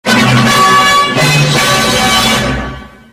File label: Double Pitched EARRAPE; Warner Bros. Television Double Toned 2